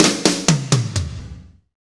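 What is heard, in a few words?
slow shuffle, Roland; Northstar; Drumscapes Roland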